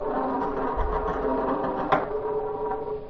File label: ball tray roll, pool